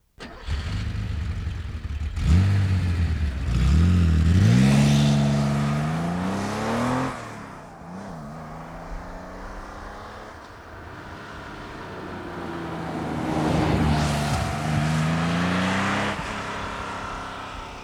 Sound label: 911 carrera rs, porsche